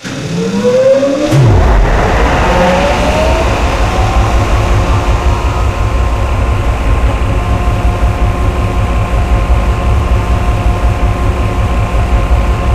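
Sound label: gel pump start loop; Source Engine; Portal 2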